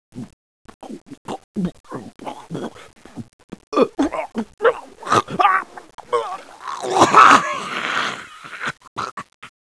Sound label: vomit